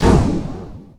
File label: Menu Magic; Menu FX